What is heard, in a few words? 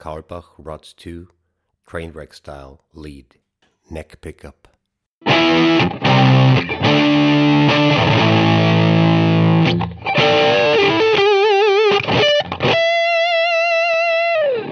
lead